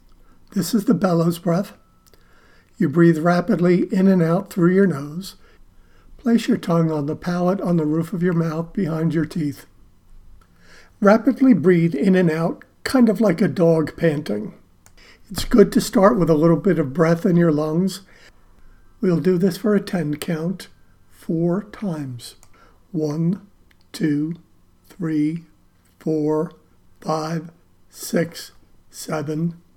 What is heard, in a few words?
Bellows Breath